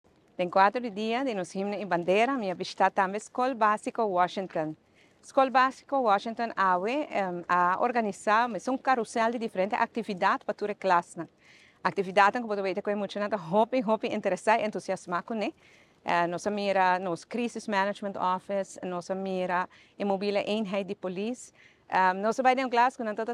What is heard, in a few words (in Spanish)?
himno; himno i bandera; scol basico washington